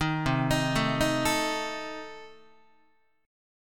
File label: C Minor 6th Guitar, chord; Cm6 arpeggiated; JGuitar